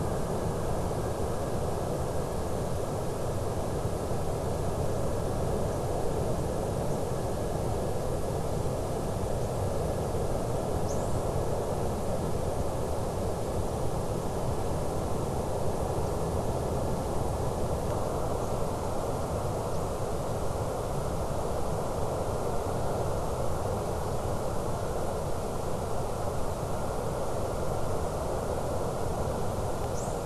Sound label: WFR audio emfisis